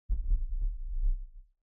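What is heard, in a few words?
metal smash low frequency effects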